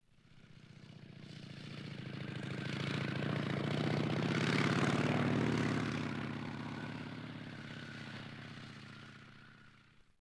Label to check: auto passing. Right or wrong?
Right